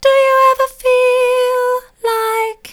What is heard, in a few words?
do you ever feel like soft, vocal